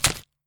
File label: Axe Impact Gore